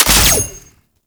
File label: Sniper Low Clip; Warframe; Sentient; Caliban Prime